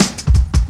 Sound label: Step Ez Cut, drum loop, jungle, 168.5